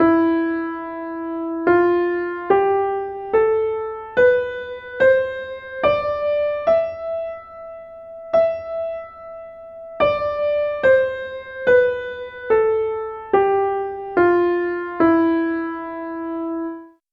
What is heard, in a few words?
phrygian